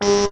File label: error